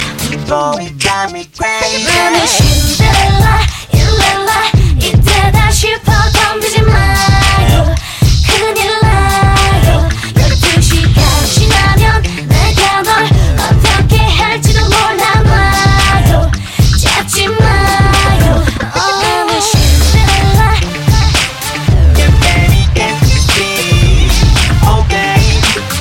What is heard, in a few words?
Hip Hop